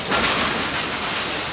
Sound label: machinery; Ambient; MX vs ATV Unleashed